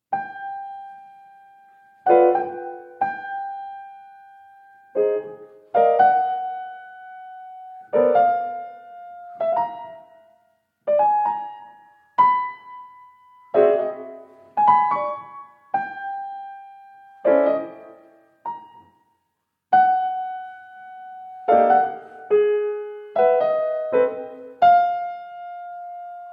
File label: Piano Music; Parsons